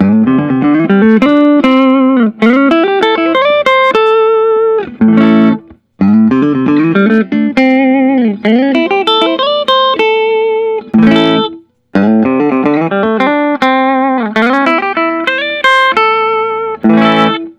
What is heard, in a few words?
paul reed smith modern eagle ii